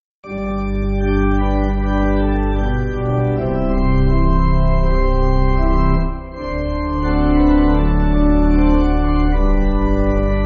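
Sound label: Just beyond The River, pipe organ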